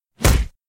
card punch

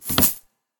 money thud

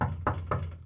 knocking

